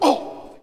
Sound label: Hockey Male Hurt; SFX Kit; Hockey Vocal Sounds